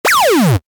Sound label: Retro SFX, Retro Style, Harsh, Heavy